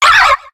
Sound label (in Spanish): Grito de Karrablast